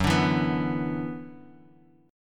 Gb 7th Suspended 4th Sharp 5th Gb, Gb7sus4#5 Chord; strummed; JGuitar